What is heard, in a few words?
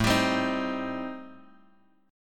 A Minor 7th Flat 5th, Guitar Open D